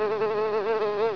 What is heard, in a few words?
fly; tnpdemo